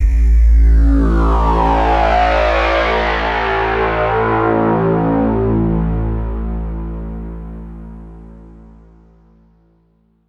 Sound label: Tonal FX A